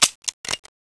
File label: empty, weapons; sapientia